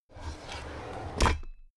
drawer cabinet crammed open regular

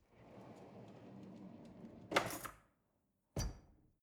drawer cutlery close draw soft

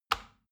plastic button tiny push regular

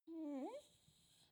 dog whimper confused sad

